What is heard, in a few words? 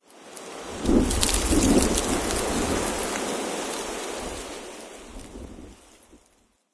leaves rustle